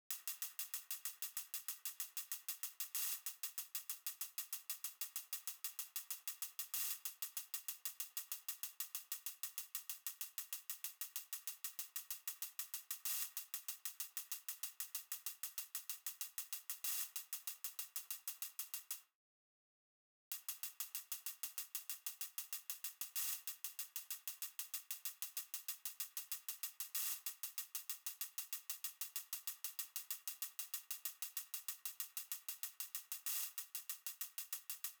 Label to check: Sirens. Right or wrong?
Right